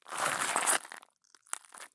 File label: stop glass; Escape from Tarkov